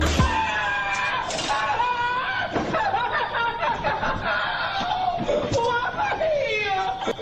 getting punched

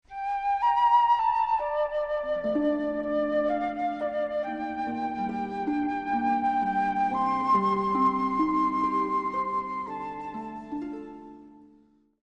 sad song

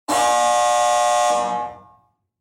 plug buzz, bzzzzzzzzzzzz; scrap yard mg

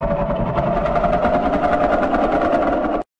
figure lower click

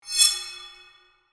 ambient metal whoosh

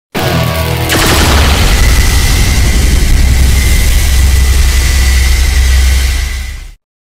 soul guitar

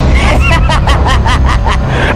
laugh evil

